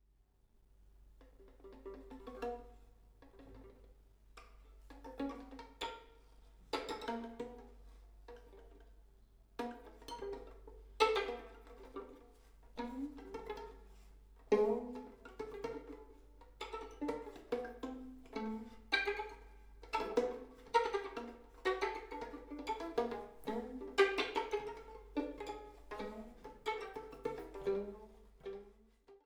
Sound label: Pizzicati